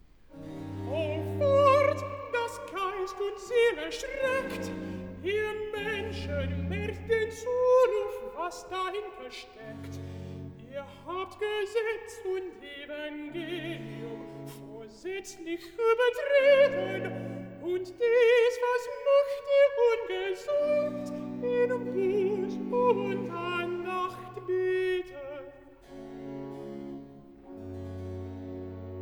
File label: Recitativo Alt, BACH for ALTO; vocal; BWV087; O Wort das Geist und Seel erschreckt